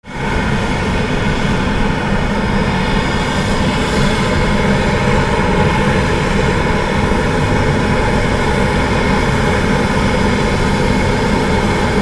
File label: flight